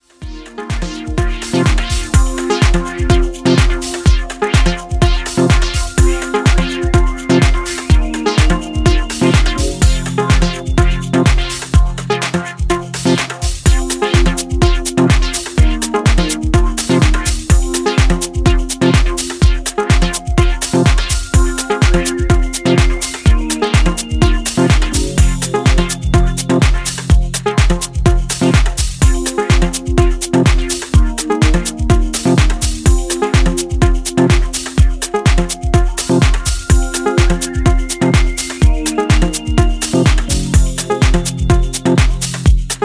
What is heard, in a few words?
instrumental